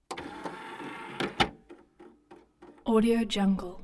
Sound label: dvd tray motor movement; prosoundfx